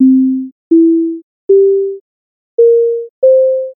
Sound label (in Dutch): simple synth; Max